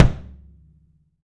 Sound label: FELT KICK; 3D Sound; 3D Drums